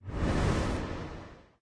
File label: body swish; smite; thanatos